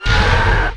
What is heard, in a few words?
dragon attack